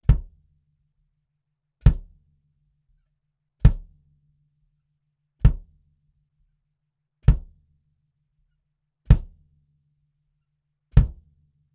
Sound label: kick d112 front